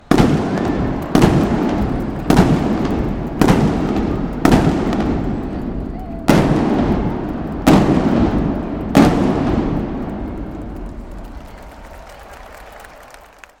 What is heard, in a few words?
firework in a stadium